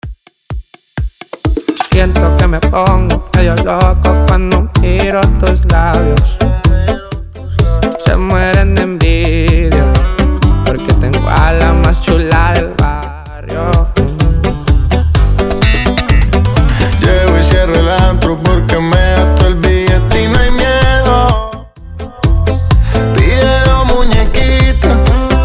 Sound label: Intro Outro 127 BPM